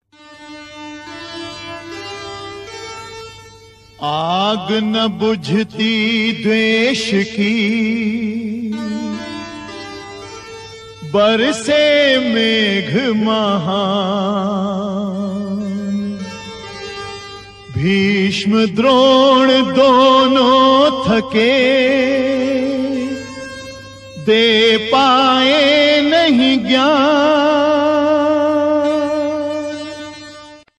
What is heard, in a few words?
ringtone love hindi song; shri krishna mahabharat